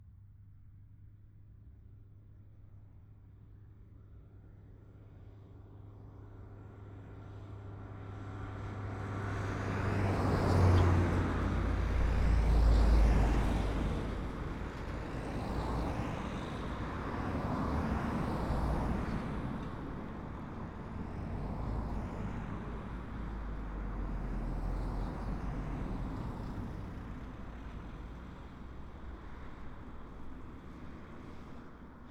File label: Cars, Vehicles, traffic, road, rural, wave, moderate speed; Pass-by; MKH8040; Sound Sower